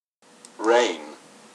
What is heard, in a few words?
rain